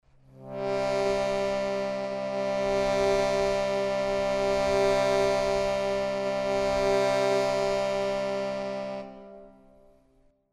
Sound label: shrutibox